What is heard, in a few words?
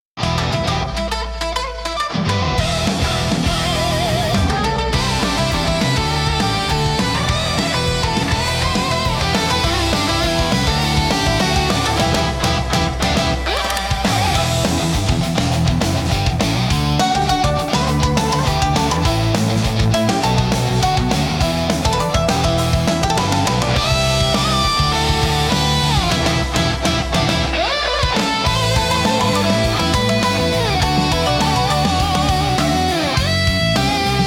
Thunder On Shamisen, intense japanese rock; for modern and stylish combat